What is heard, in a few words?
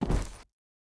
bear foot back